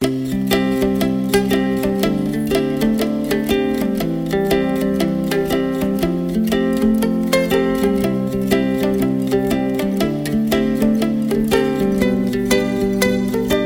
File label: Uke Happy LOOP; MIUI14 and HyperOS 1.0